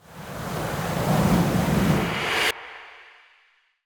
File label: Ice Spell Freezing Slowing Frost Breath; Arctic Aura